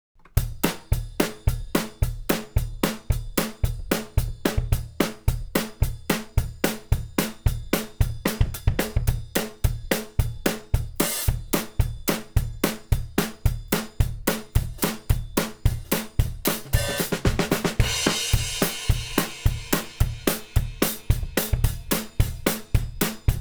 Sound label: Gospel Drum; MusicDelta; MDBDrumsPlusPlus